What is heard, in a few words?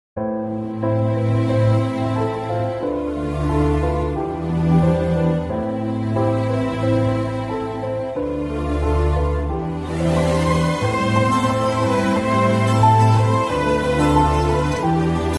sad bgm ringtone; 3 movie